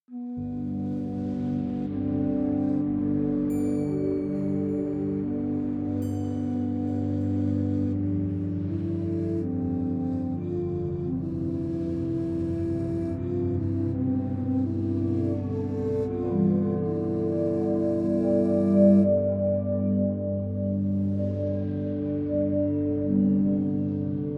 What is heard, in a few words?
corporate flute